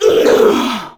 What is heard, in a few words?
Death, Female; Warframe; Grineer Female; Battle Barks